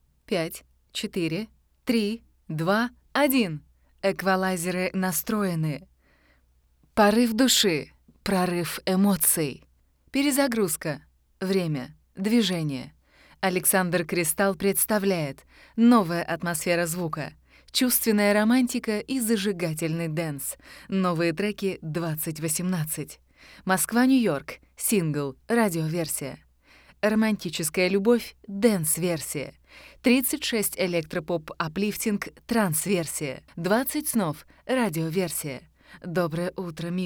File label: Jingle